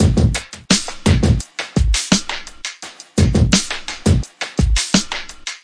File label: chunky groover, drum loops and breaks, 085 bpm